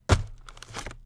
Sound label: wheat punch; PixelDefense